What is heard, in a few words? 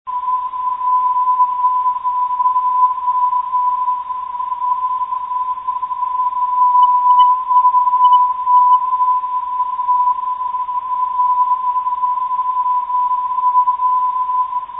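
non-directional beacon, 395 kHz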